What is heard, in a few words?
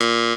buzz